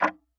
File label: Tick, Clocks Kitchen Clock